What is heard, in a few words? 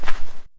dirt footstep